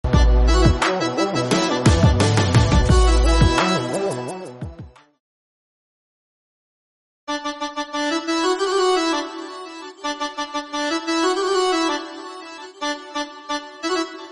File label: Instrumental